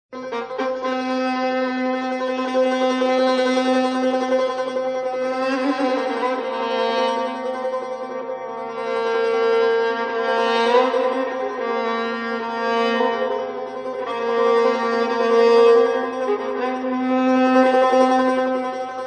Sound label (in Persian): Moghadameye Dashti (Violon, Tar, Tonbak)